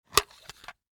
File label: Cassette Case Open; Tape Players; Electronics